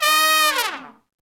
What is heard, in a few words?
SECT BRAS FALL